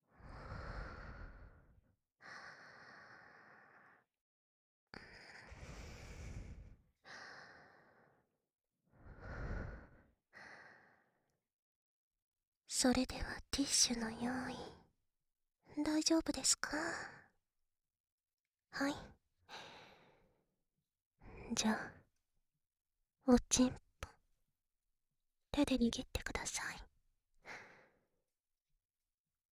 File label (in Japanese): 耳舐めおなサポ（左; おにいさんへ（ゆいかより